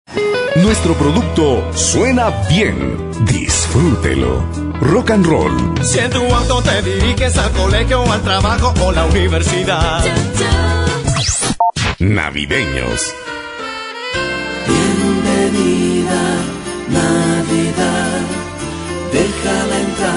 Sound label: Jingle; Jingle mix 1